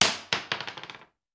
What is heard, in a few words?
Bullet Shell; Sound Ideas; Guns